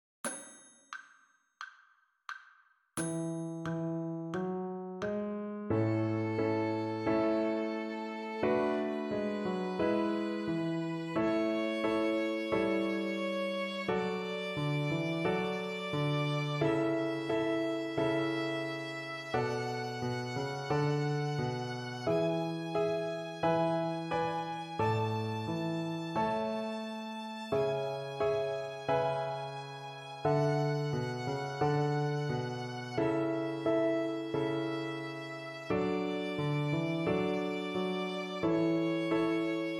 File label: a major scale